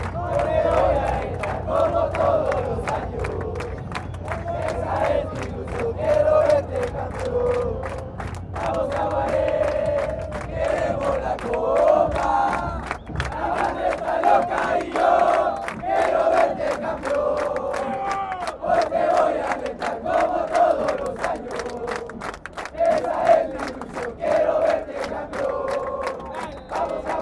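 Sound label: yo te voy a alentar, football chant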